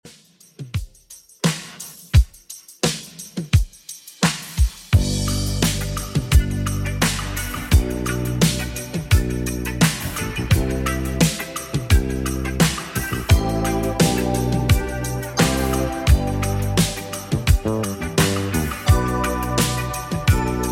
80s Redrum 86 bpm

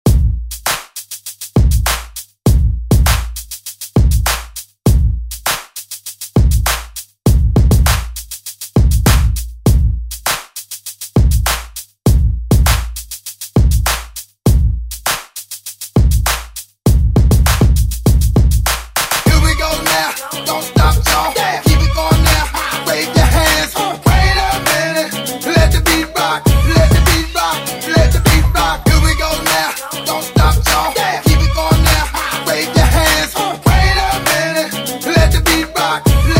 Party Hype Redrum; Deejay Hit

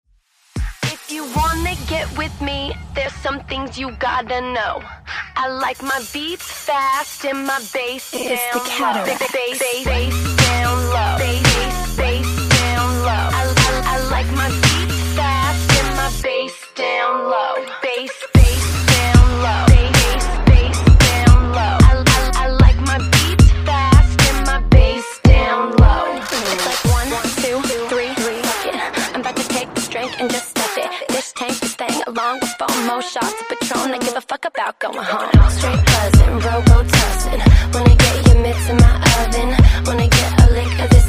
bass down

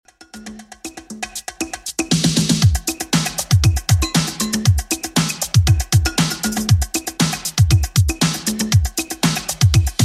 80s Redrum 118bpm

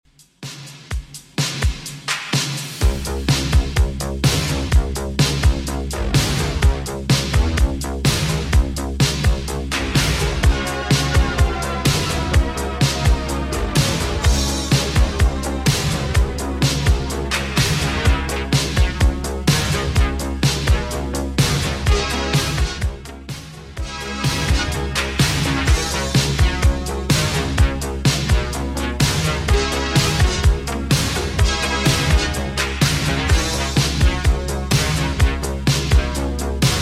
80s Redrum, 126bpm

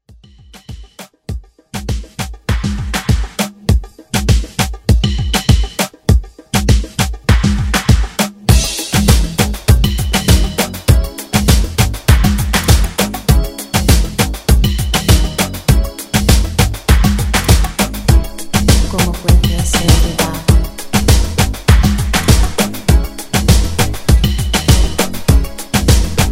80s Moombah Redrum

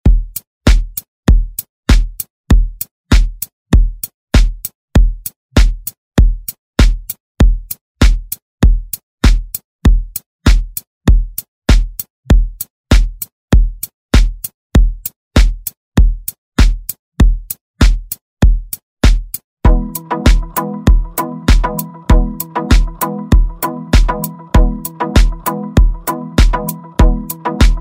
Extended Redrum

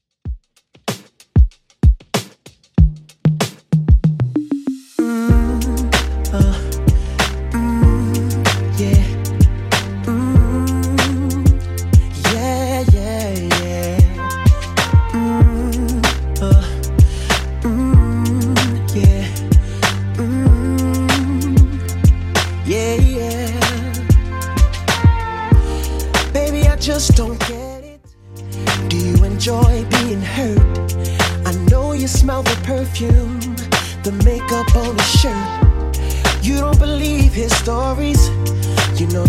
2ks Redrum 95bpm

